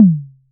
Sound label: toms, disco; Best Service ProSamples vol.48 - Disco Fever; AKAI